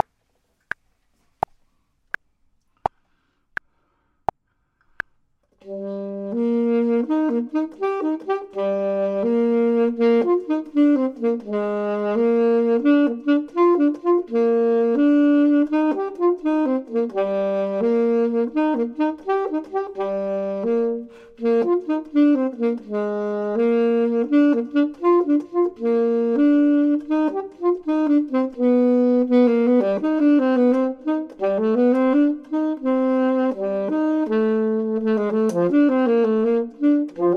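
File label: bottom, Saxophone and Clarinet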